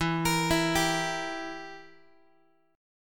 E Diminished down Guitar; Edim arpeggiated; JGuitar

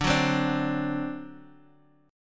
D 7th D Guitar Standard; D7 strummed; JGuitar

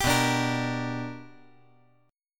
B Minor Major 7th Sharp 5th, chord

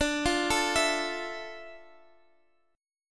F Major 7th D, Fmaj7/D chord; arpeggiated; JGuitar

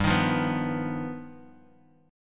G Augmented 7th; G+7 strummed; 15,18,15,16; JGuitar

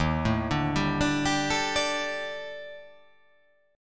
D sharp Major 7th Flat 5th D sharp, chord; chordshape

